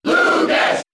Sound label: Cheer English; Lucas; SSBB